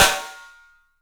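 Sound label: PICOLO SNRS, snare, drums; 3D Sound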